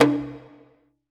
Orch perc; musicradar; cartoon caper

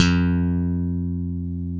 F-1 SLAP, bass

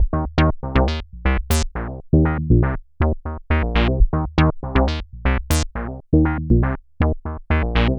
Bassline, Prototechno

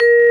VIBE

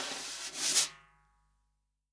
SWING SNARE CHROMATIC, Real Drum Kit; REAL DRUMS

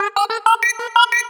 Sci-Fi Lasers - Droid Talk; Antidote Advent

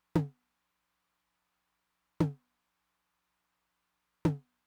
high tom, Roland TR-909; Roland TR-909 Ableton Project

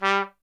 trumpet, G 1; Miroslav Vitous; Solo Instruments VOL-1

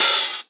Open HH, Cymbals

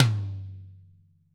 TOM-TOM-L, ACOUSTIC KIT; Greytsounds VOL-1

